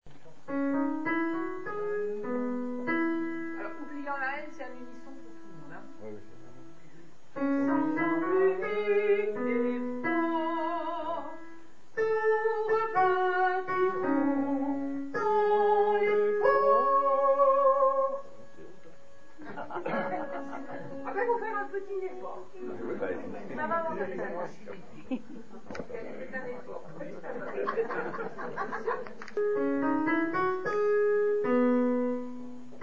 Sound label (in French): Brume Basse